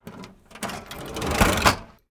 cash register

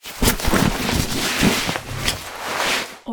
war ui source put on filled backpack; esmteam

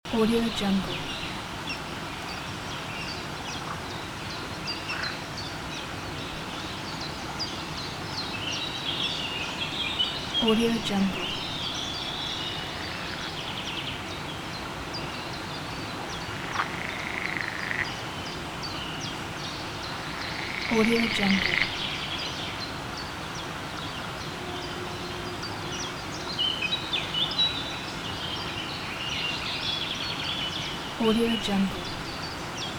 swamp forest near river cascade ambience

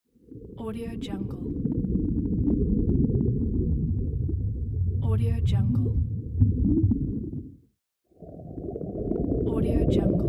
under water bubbles

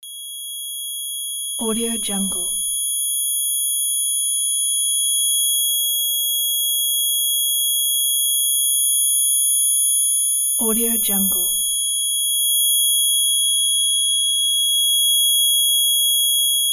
ear piercing ringing

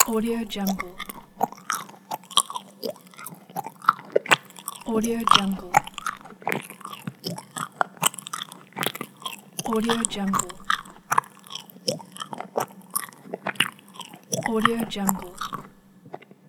chewing

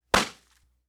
balloon pop; prosoundfx

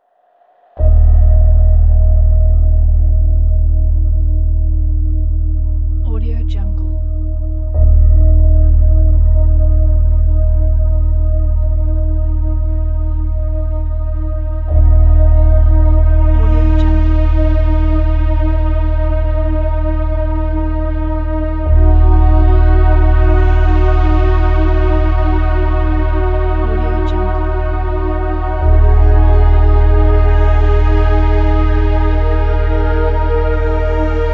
ambient space

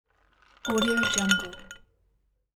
ice in glass

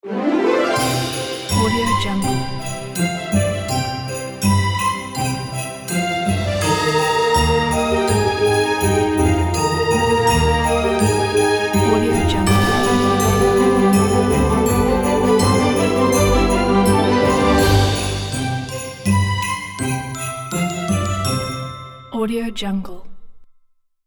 christmas elegant intro logo

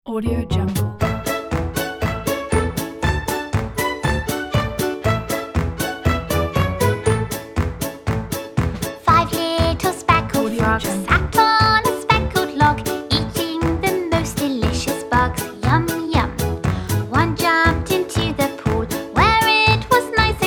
five little speckled frogs

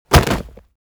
impact body fall concrete leather hard

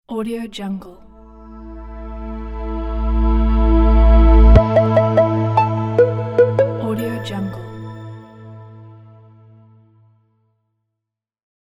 catchy logo

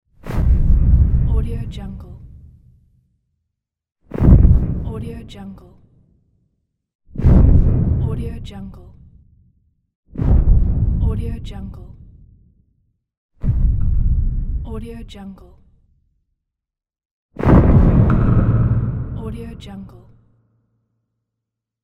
thud; volkovsound

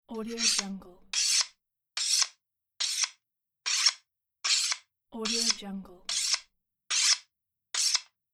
knife sharpening

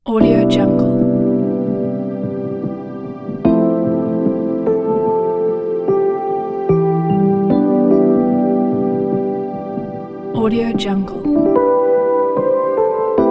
melancholy

